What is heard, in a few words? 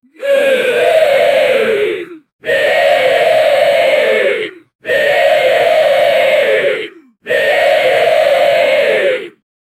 goblins chanting